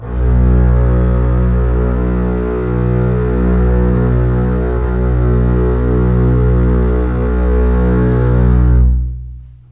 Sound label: B1 LEG F, String; Ultimate String Collection